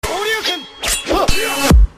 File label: Sword Clash